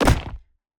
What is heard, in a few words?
Object Impact Hit